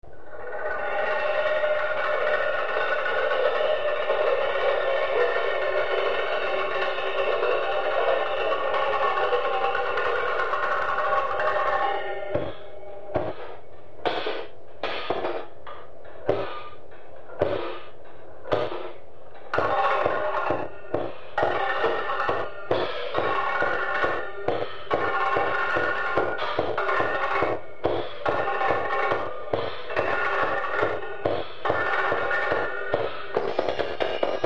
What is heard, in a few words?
drum solo